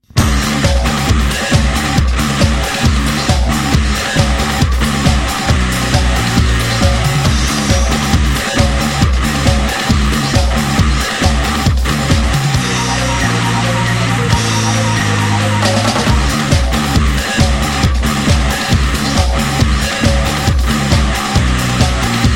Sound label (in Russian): hard rok; Хард-рок